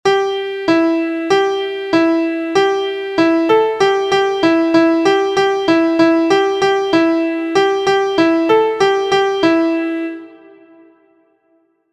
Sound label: MIDI